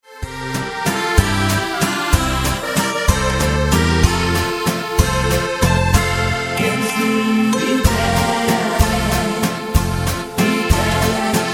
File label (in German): mit Chor